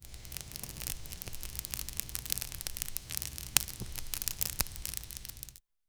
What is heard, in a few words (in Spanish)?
una fogata, burning